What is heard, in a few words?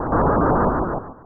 Burst into flamse & Breathed fire; mother2